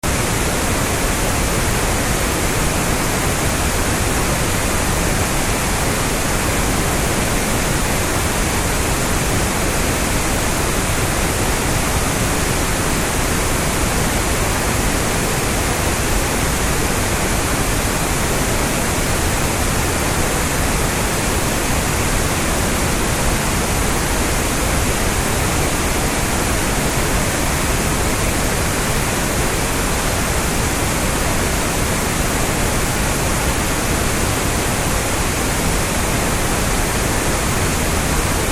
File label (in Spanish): centrada en 8000 solo adyacentes; Penalización por impulsividad y tonalidad